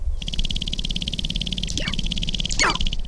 run, Sharp-tailed Grouse; Gallinaceous; 3 sec

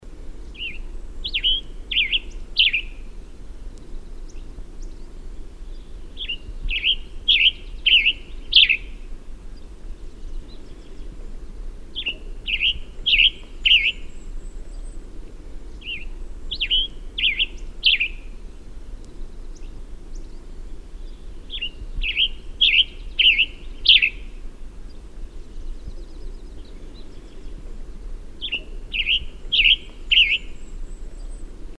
Western Tanager; Tanagers

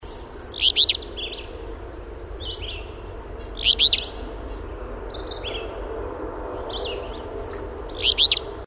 Alder Flycatcher; Flycatchers

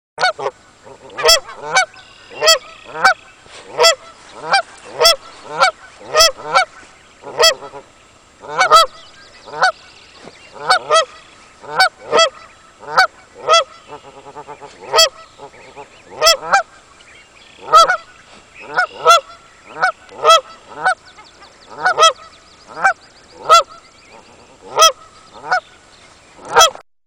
Mother Goose calling babies, Canada Goose; Ducks

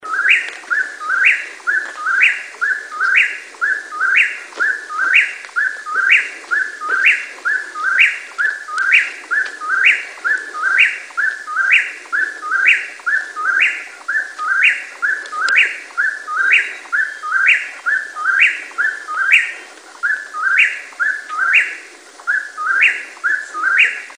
Whip-poor-will; Nightjars

